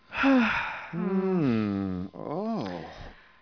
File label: people